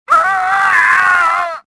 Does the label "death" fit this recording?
Yes